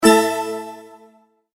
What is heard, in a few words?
multimedia tone exclamation; McKinney Sound